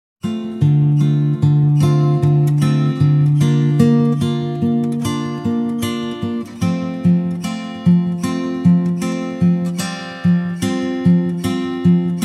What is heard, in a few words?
Arr. For Guitar